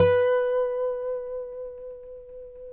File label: Finger B 4 R, Guitars; Spectrasonics; Hans Zimmer Guitars VOL-1